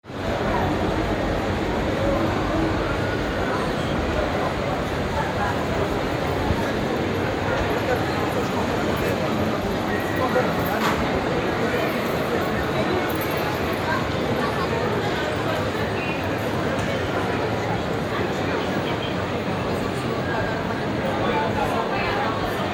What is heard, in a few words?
shopping center large